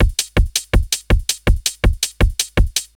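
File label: 4 on the floor, loops 150 bpm; Masterbits - Tekkno Trax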